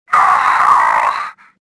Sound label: pain; stofficer